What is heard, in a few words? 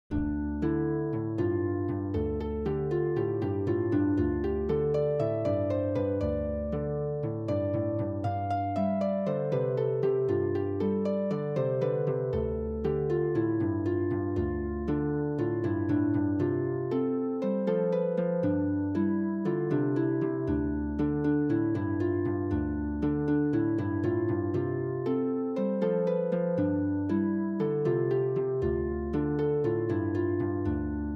harp